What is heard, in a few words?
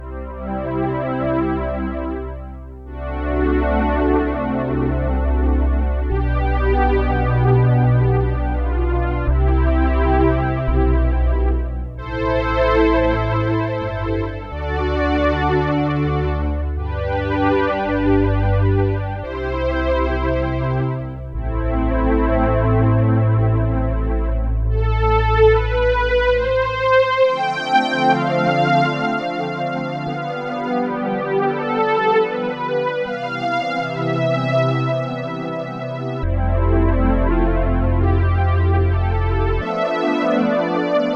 Strings, Roland JX-8P